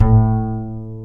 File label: acoustic bass